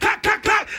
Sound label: cack cack cack, Voice; ooh ahh